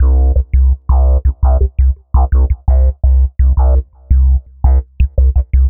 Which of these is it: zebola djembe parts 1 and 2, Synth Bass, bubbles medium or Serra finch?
Synth Bass